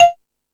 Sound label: Percussion, Drum Machines E-MU Pro-Cussion